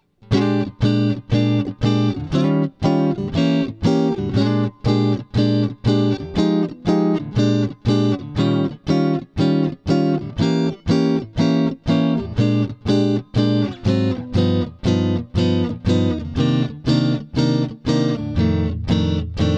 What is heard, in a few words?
blues jazz